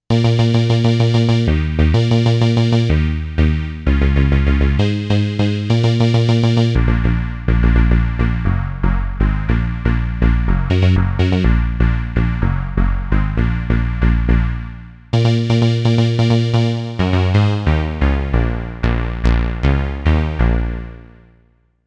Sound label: Pitchie Stabba